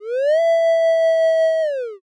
wolf howl

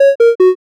picosad

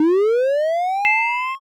charging

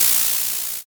fizz

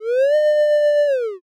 dog howl

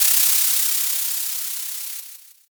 sparkler

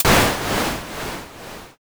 thunder

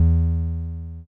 bass

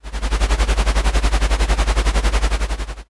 helicopter

